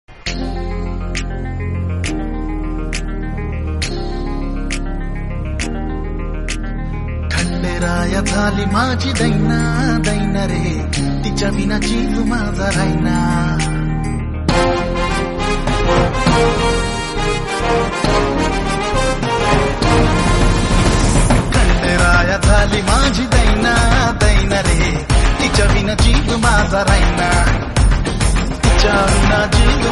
Marathi ringtone

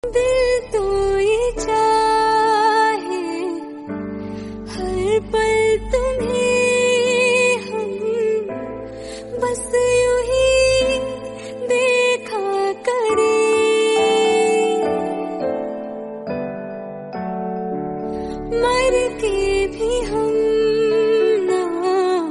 FEMALE RINGTONE

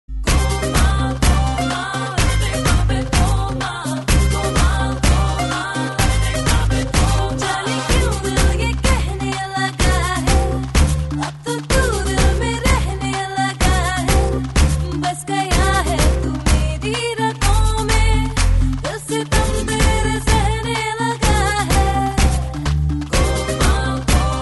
FEMALE RINGTONE; GOLMAAL

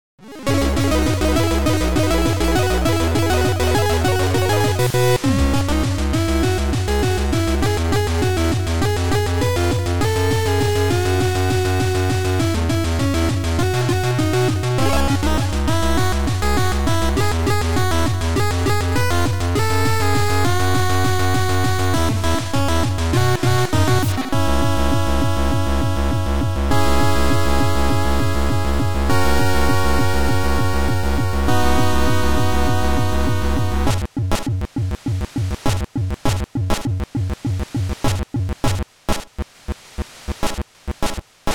KEYGEN MUSIC RINGTONE; TRANSFORMERS

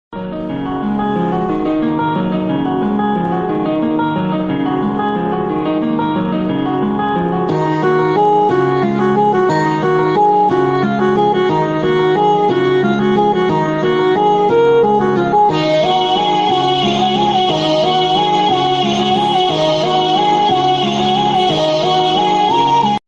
WEB SERIES BGM MUSIC RINGTONE; SURYA

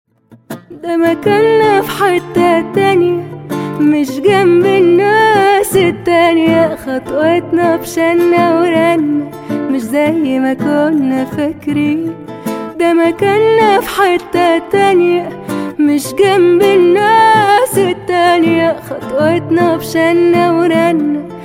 ISLAMIC SONG RINGTONE

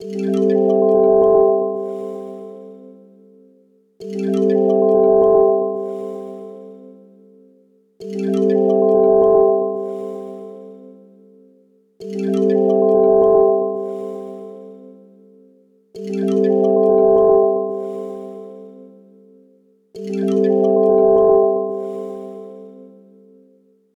soft chime alarm ringtone

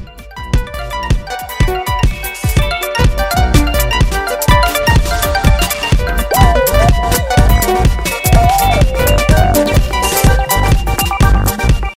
vivo mobile ringtone

